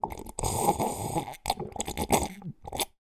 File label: human slurp drink from cup with gulps